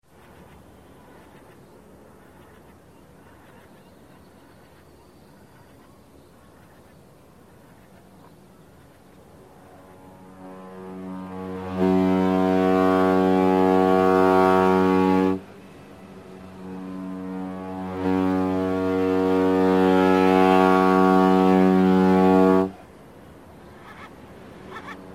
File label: boat; reverse